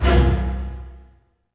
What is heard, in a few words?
HIT, Orchestral; Orchestral Loops; 128 STRAVNSKY